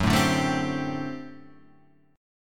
G Major 7th Suspended 2nd Suspended 4th F sharp

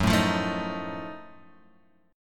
F sharp Minor Major 13th, Guitar, Open G

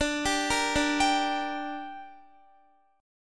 D Suspended 4th Sharp 5th D down Guitar, chord; arpeggiated

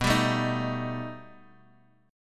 B Augmented 7th B down Guitar Open G

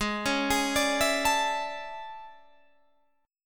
A Major 11th G sharp

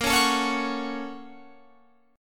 Bb Minor Major 7th Sharp 5th, Guitar, Open F; BbmM7#5; strummed; JGuitar

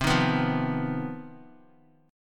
C Minor Major 7th Double Flat 5th chord, Guitar; strummed; CmM7bb5

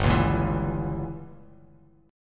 G Diminished 7th down Bass Standard; strummed; JGuitar

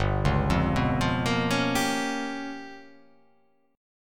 G 7th Sharp 9th Flat 5th

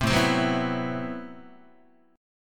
A Minor 6th Add 9th Guitar, chord; Am6add9 strummed; 3,5,3,4,3,3; JGuitar

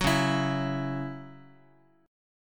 C sharp Minor Double Flat 5th, Guitar; strummed; 9,9,7,x,x,x; 4A,4E,5A,5D,5G#,6C#

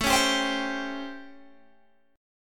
A 7th Sharp 9th, Guitar, Open G; 19,18,17,17,20,17

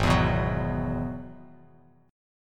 Ab Minor 7th Sharp 5th Ab, down, Bass Standard; strummed; JGuitar

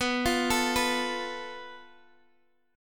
F Major Flat 5th B

